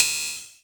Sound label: Percs, Elektron MachineDrum